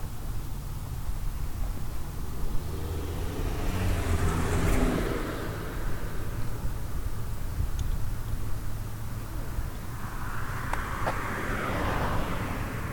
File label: Two way traffic